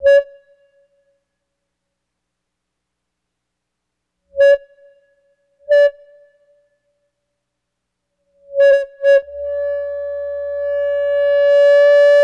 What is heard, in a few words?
Beep Boop One, Feedback